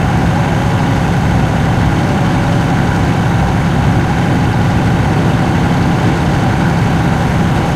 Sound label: tank engine int rpm load; War Thunder